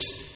airy open hat sample c sharp key, Royality free open hi hat sound tuned to the C# note.